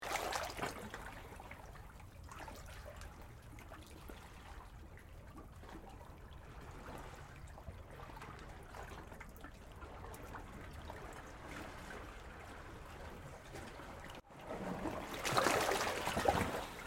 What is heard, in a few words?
gentle waves swirling in sea cave with a blowhole